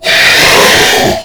Attack Scream; Warframe; Stalker

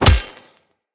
Melee Fist Impact Robotic Flesh

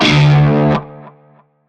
Shawzin Oct One D; Music; Warframe; Narmer Shawzin

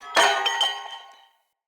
Corrupted Battle Dog Bell Charge Start; Foley

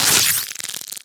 Micro Web Detach; Warframe; Corpus; Arachnoid Micro; Abilities

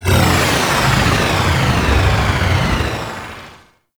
Stomp Wave; Enemies; Weapons; Warframe; Tau; Sentient Dactolyst

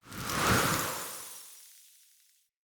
Wyld Soul Mode Activate; Fey; Saboteur

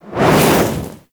Look Whoosh; Gameplay; Ballas; Warframe; Chimera Prologue

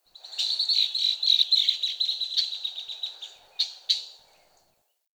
Bird Black Bird; Ambience; Warframe; Nineteen Ninety Nine

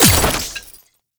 Ammo Cast Start; Warframe; Frumentarius

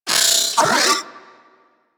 Vocal Detonate; Warframe; Corpus; Mini Moa

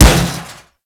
Impact Metal Heavy, Weapons; Warframe; Furax; Impacts

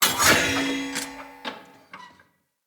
Light Mirror Hit Move C; Puzzles

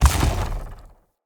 Wave Orb Ground Impact

